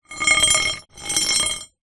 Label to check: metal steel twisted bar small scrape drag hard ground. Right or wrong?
Right